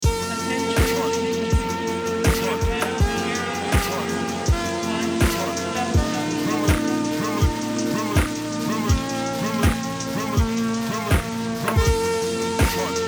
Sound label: retro test